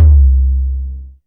FAT TOM; Back In Time Records; Big Bang

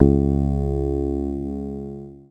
C#, Synclav FM PLUCKED; Big Fish Audio

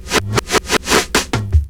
DRUM FILL

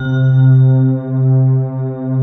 GLASS PAN, pads; Pro-Rec - Synthsonic

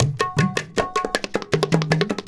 INDIA R, Heart of Asia, IND ENS

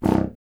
C. Bassoon, solo instruments

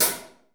HH R, DRUMKIT

